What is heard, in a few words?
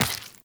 Step Flesh, Footsteps, Walk; Warframe; Characters; Tenno